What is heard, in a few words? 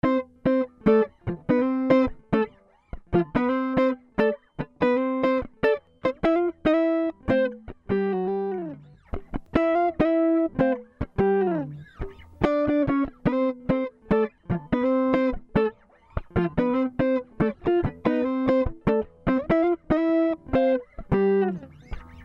Guitar Dry Wet